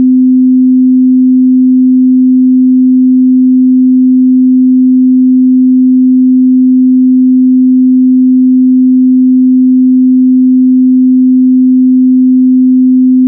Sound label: 256 tone; Vinyl-I-Digitized